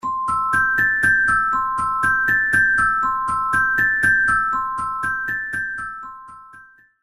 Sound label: Vibrawater